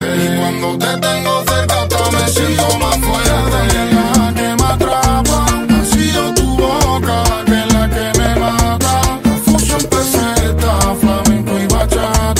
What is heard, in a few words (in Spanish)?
Flamenco y Bachata